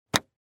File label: Sports Golf Club Hit Golf Ball